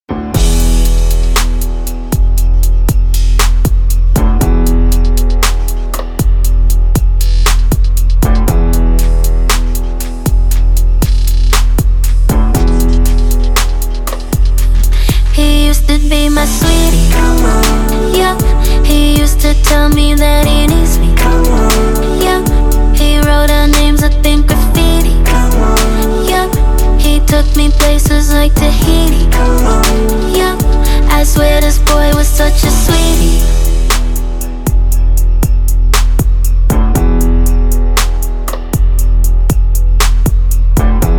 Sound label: Mainstream Rap Sung Hooks Female